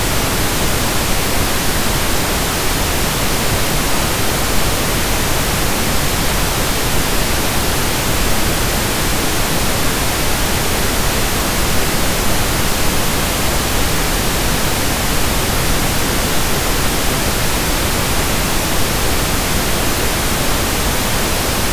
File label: pink noise